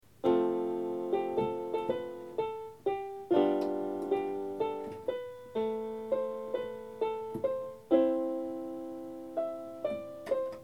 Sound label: Keyboard Practice Improvisation